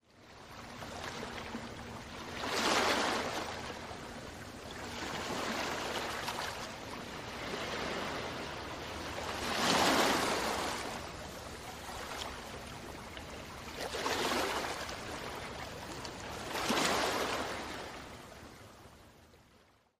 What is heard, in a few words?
waves, nature